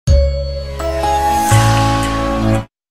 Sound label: Jingle; Peugeot